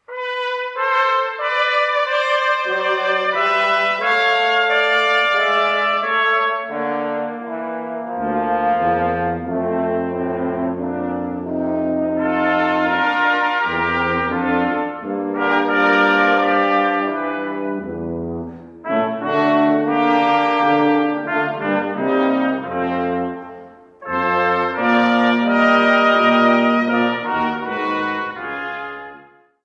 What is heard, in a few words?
Two Madrigals; lo bur respiro